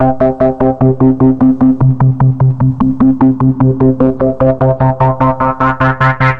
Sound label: 150 the-throb-synth, synth and electronic loops